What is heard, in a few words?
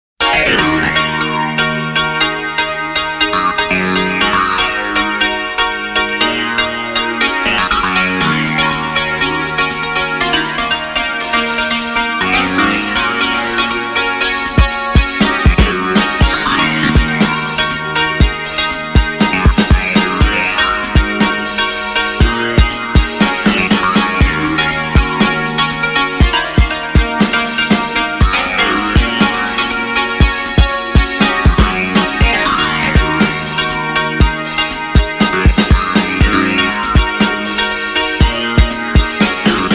Funk